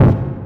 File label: hit, sfx; rust